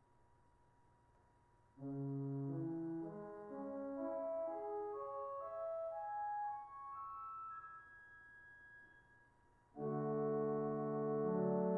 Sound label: choir giegen diapason, organ; Giegan Diapason; The Geigen, whose name comes from the German geige, meaning “violin”; Choir Division (West Chamber)